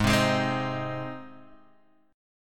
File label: Ab Major Ab; strummed; x,x,x,1,0,3,2,0; JGuitar